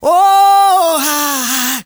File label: MALE SOLO, Heart of Africa; Spectrasonics; Heart of Africa 2; S.MALEDRY